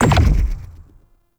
Noctua Shot Punch

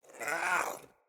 Pet Speak; Enemies; Catbrow; Pet Ship

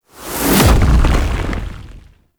Last Grasp Enter; Warframe; Focus; Unairu

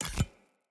Urn Metal Pickup

